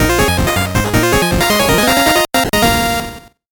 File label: 8bit jingle fast